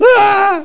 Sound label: fright; Games.Carmageddon